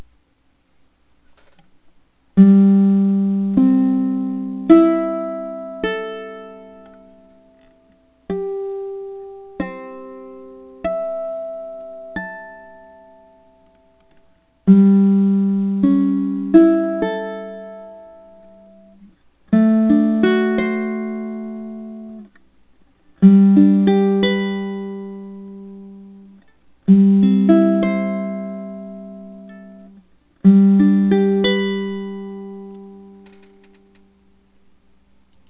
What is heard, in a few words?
4 tnr note, ukuleles